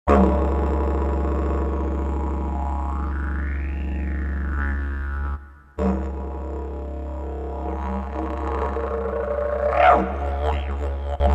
Native Didjeridoo

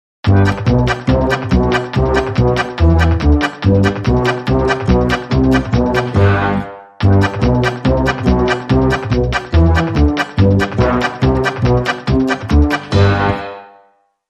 Country Polka, Sting

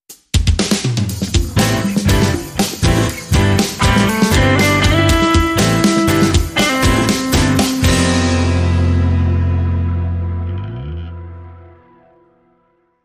Piano Driven

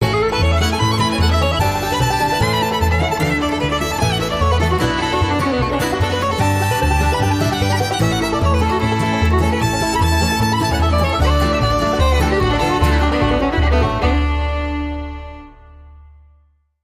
Bluegrass Pickin Party

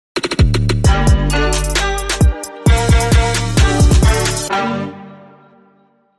Sting

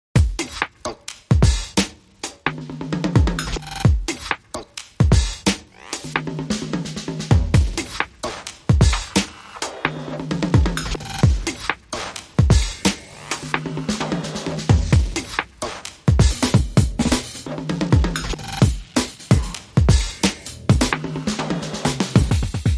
Beats Only

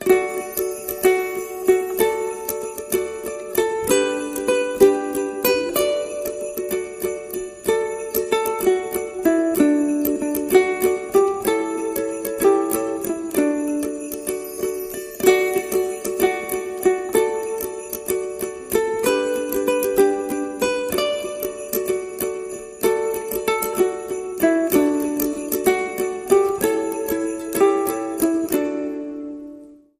Ukulele Bells

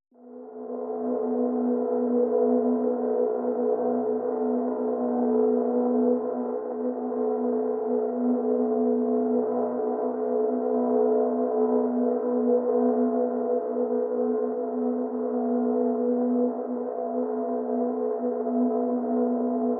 Pads B

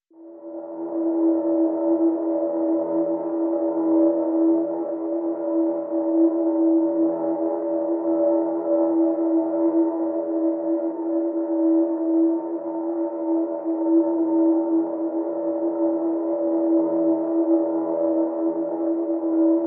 Pads E

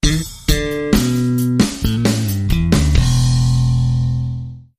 Funk

